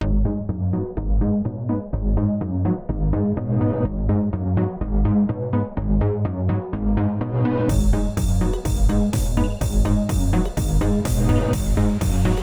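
dance synthesizer, fast, hand vibration, short soundtrack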